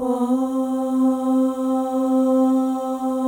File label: OH, FEM, Symphony of Voices; Spectrasonics; FEM.1 OHS